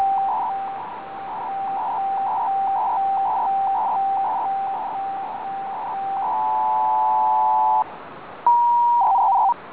ALIS2, ALIS (version 2); ROHDE & SCHWARZ

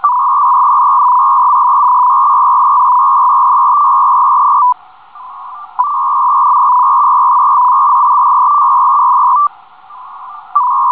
225 Bd (FEC-BURST); ROHDE & SCHWARZ

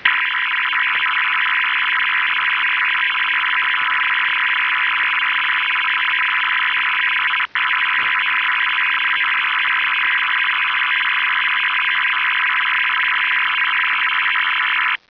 US MIL spread, US-MILITARY 4-FREQ. MODEM; (phased out in favour of MIL-STD 188-110A)

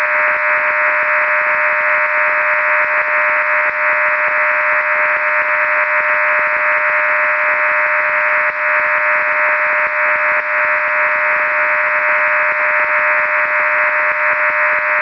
MIL188-110A App A pilot, 16-tone modem; MIL-STD 188-110A app. A 16-tone modem in 600bps mode; MIL-STD 188-110A appendix A; including 605 Hz pilot tone; PSK-systems

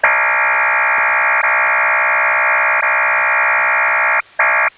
CLOVER 2000 8PSM; XCLOVER in 8PSM-mode; CLOVER-2000 / XCLOVER WAVEFORM; PSK-systems

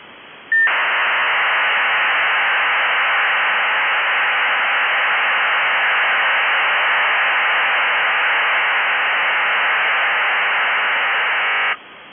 2400 Bd QPSK; EGY-NY

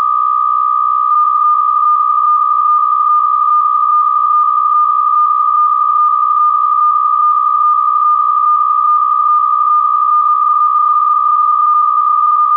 PSK63F

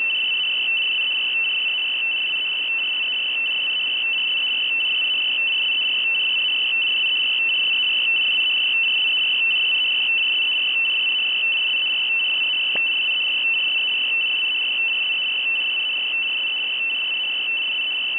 DCS; Israeli military station sending plain DCS signal without any additional audio or data; Tadiran

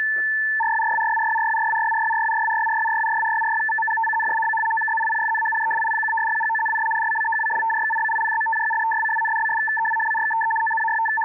BAUDOT 1 stopbit; running 75 Bd/850Hz with KG-84 crypto

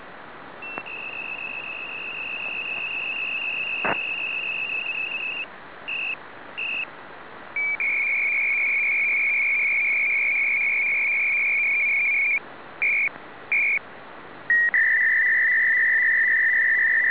DUP-ARQ ALE, DUP-ARQ SELCALL

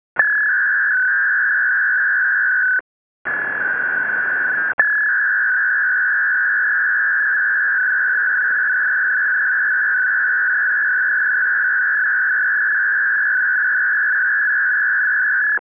PACKET, PACKET-RADIO AX.25

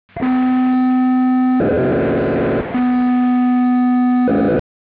POCSAG 512; POCSAG running 512 Bd; FSK PAGING MODE; used on upper HF and V/UHF; FSK-systems

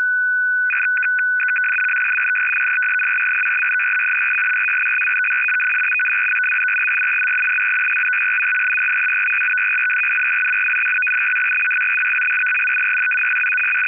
RUSSIAN INTELLIGENCE 200Bd 1000Hz 288-BIT FRAME

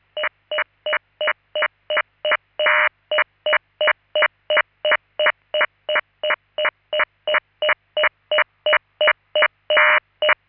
LINK11; ALLIGATOR; PSK-systems

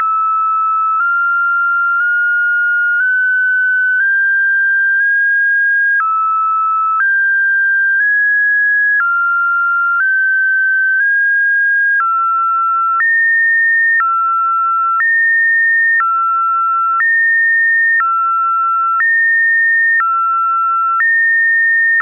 RUSSIAN INTEL MULTITONE SYSTEM (12+1)

